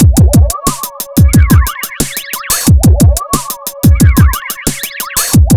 Electro break

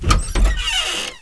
Door opening with creak

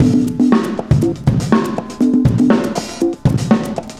cooling 120

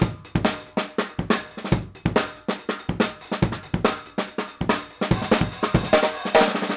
break, loops

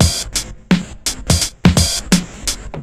drum loop

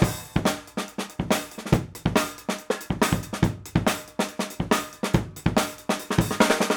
break, loops

